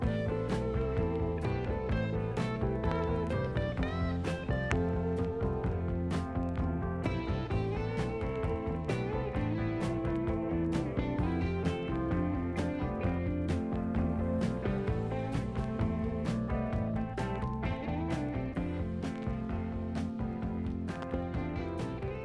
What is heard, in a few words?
Audio-Technica AT-LP120-USB Turntable